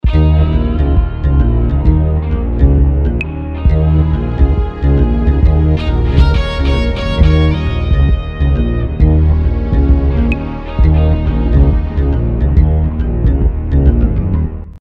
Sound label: Tiny Dance